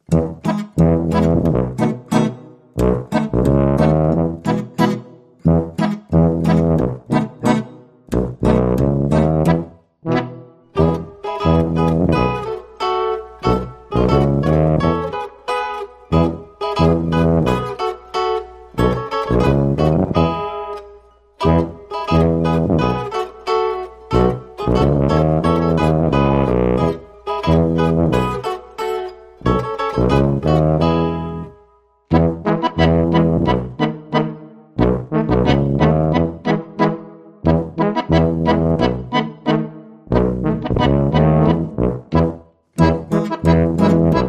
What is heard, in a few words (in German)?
Oide Zither Zipfen